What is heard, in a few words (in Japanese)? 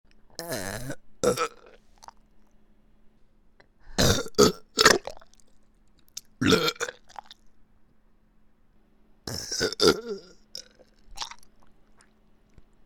【右】げっぷシーンのみ